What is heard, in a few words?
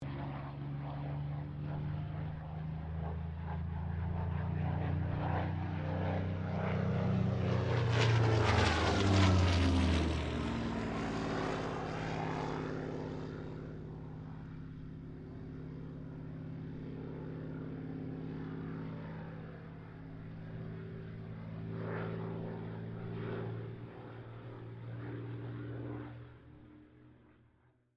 AIRCRAFT SUPERMARINE SPITFIRE MK-XVI PASS; Airplanes